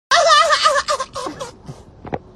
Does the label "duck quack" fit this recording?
Yes